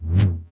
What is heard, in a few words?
pike swing; weapons